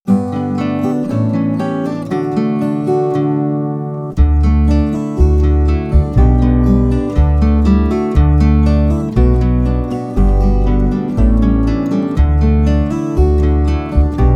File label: Instrumental